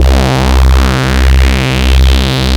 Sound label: Hard Bass, C